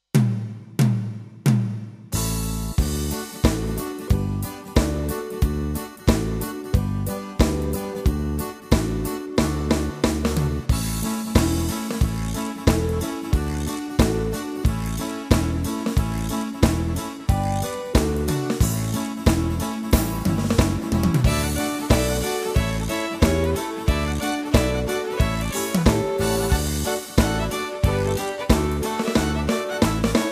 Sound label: Folk Shuffle; tyros2; Modern Folk